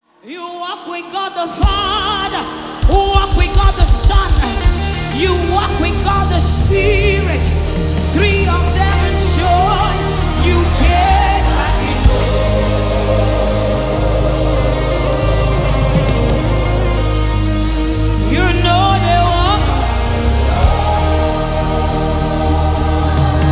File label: Backing Live; gospel